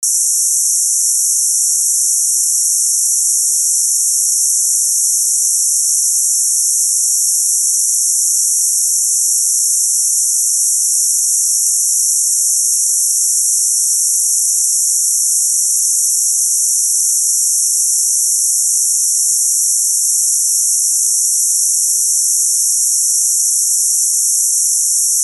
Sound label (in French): bruit rose